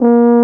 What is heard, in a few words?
F-TUBA B0; Best Service; Alpine Volksmusik